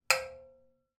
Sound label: impact metal object hit metal ladder